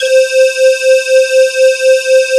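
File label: U220 calliope; Greytsounds; Scott Peers Sound Engineering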